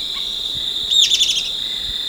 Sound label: Lathrotriccus euleri alarm